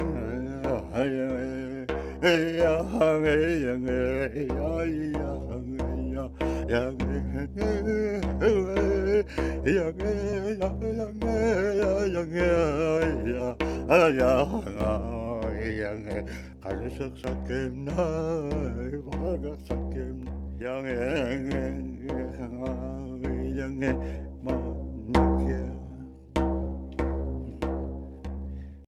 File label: Sing Drum; Never Alone